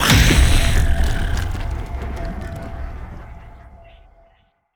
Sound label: lithoid spider; lithoid portrait; Stellaris